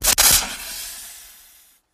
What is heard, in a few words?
Snake Exhale And Spit